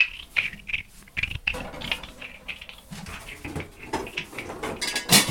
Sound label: clang